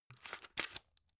drug pull out; arzt

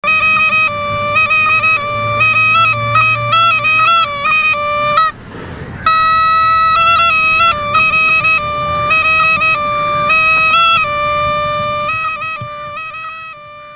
india snakedance; music elements; grooves

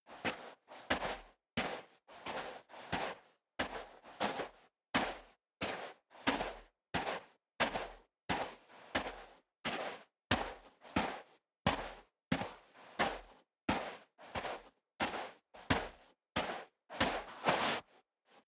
step boots sand walk sequence

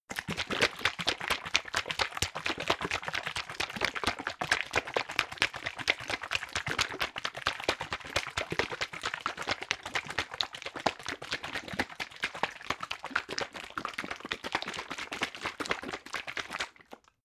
bottle syrup shake long; berufe; gastronomie

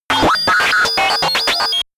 calculator high, comedy cartoon robot; soundpool

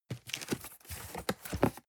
cardboard box open unfold; objekte

